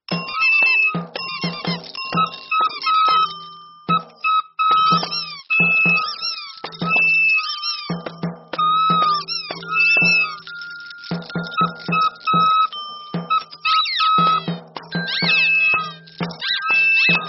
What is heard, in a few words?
Kids Band; Nursery